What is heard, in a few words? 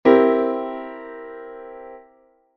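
C7 chord; ジャズピアノ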